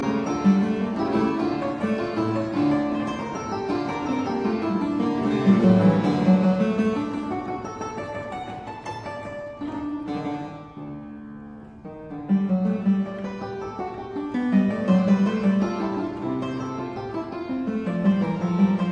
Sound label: Keyboard Sonata in A minor; Seixas; No 71